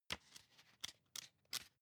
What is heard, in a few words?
magazine browse